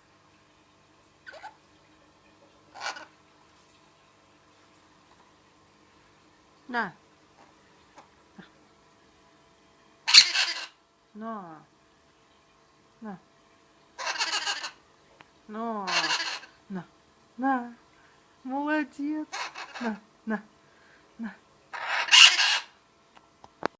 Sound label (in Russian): kubinskii amazon, parrots amazona leucocephala